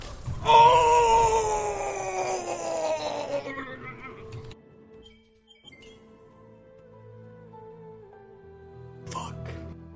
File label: deathrattle